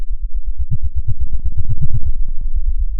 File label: pop, Sound of a dinosaur